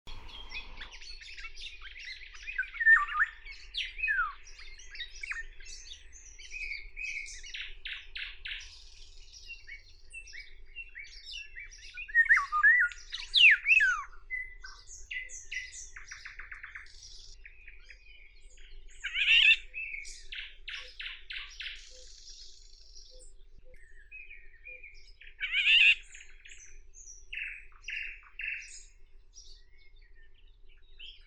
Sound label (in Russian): ivolga obiknovennaya oriolus oriolus